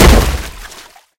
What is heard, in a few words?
mud fall; Golden Crayon Sounds